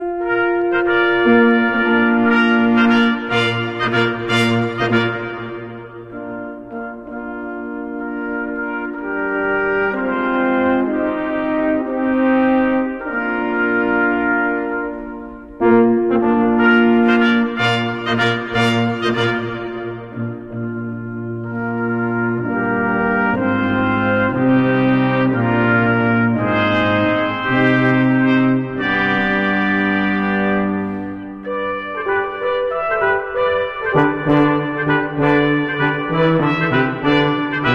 brass ensemble; Hodie, Christus Natus Est; Christmas

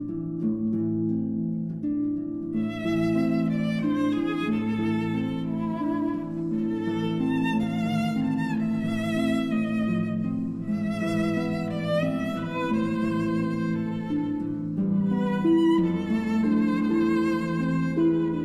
Berceuse, for violin and harp

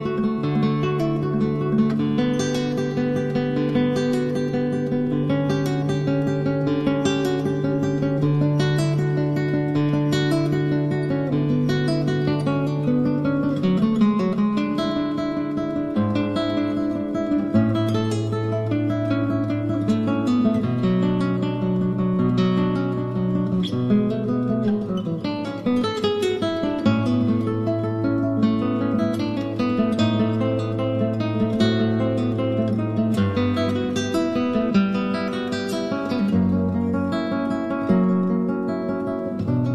Cello Suite No. 1- Prelude

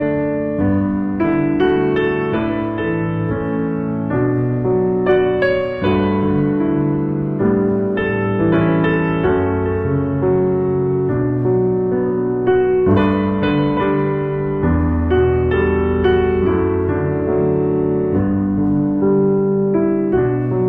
piano, Shenandoah

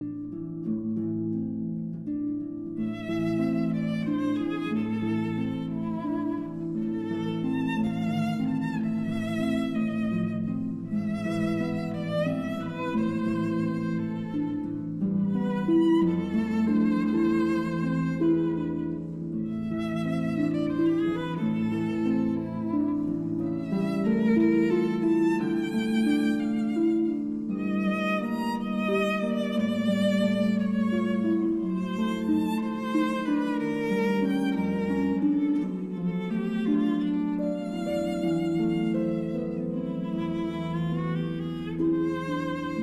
Berceuse, for violin and harp

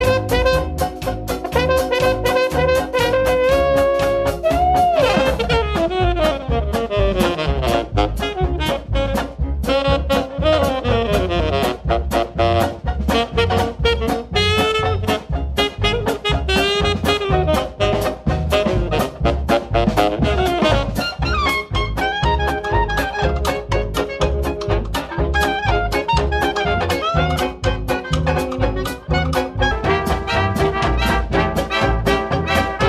Dance Orchestra

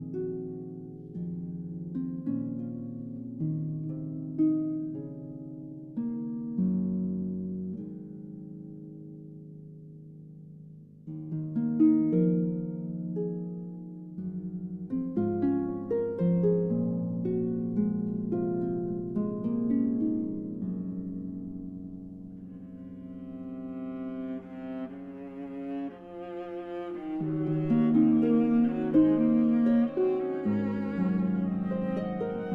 Harp Concerto Lullaby

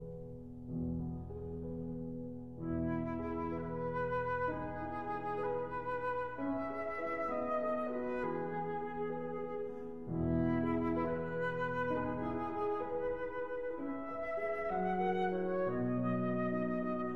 Berceuse (Lullaby) for flute and piano

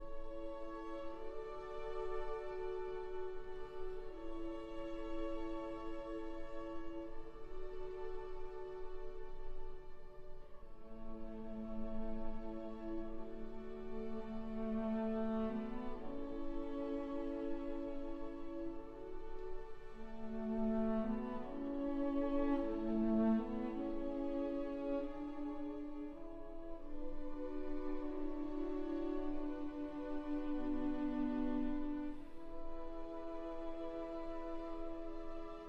for cello and strings

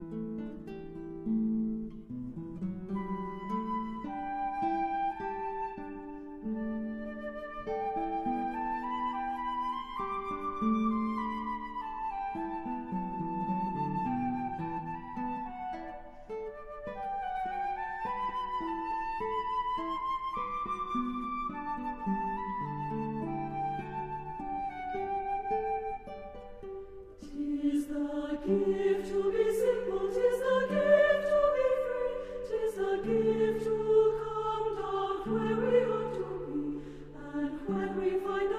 version for guitar, flute, chorus; Traditional; Simple Gifts

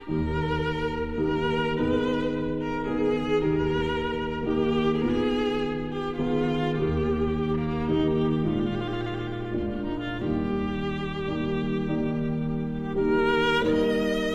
Sicilienne for violin and piano

